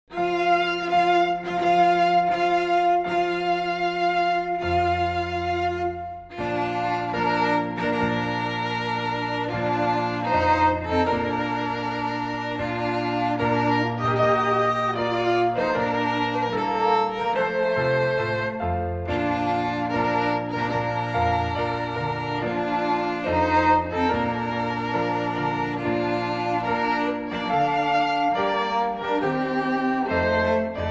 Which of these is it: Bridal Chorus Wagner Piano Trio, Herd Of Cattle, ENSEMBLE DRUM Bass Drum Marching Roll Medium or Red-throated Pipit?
Bridal Chorus Wagner Piano Trio